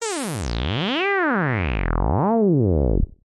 synth bebot sawtooth robot slow wobble; Jessey Drake

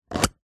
foley tin very small circular lid open; zapsplat

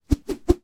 foley wood bambo swoosh through air; zapsplat

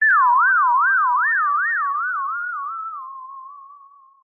synth theremin robot wobble

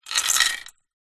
animals dog leash metal chain set down wood floor

